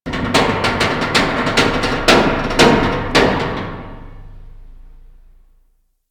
Metal Creak; Ambience; Interior; Warframe; Orokin Derelict

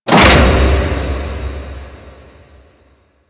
Gas Storage Container Stop; Ambience; Warframe; Corpus Gas City

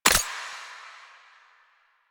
UI Mod Rank Remove; DE; Warframe; Mods

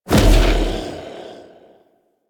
Edge Kick Large; Warframe; Warframes; Pacifist

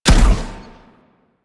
Grenade Launch; Warframe; Vehicles; NechroMech; Abilities

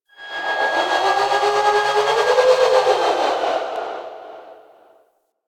Metal Groans And Scrapes, Asteroid; Ambience; Warframe; Grineer Asteroid

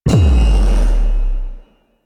Backstab Cast Start; Warframe; Warframes; Pax Duviricus

